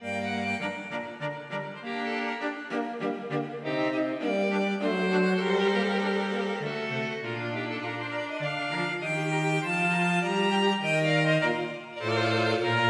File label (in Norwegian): Menuet for strykekvartett